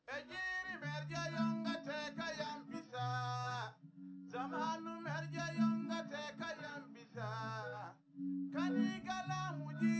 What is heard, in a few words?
no drums live rec 250 ms 5 cm